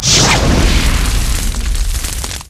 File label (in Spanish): manos ardientes, hechizos; alteracion